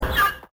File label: Chirp